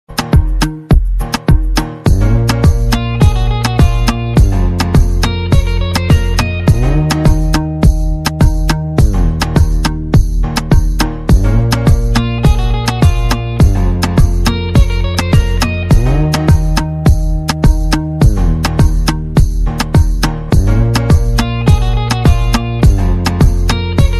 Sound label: instrumental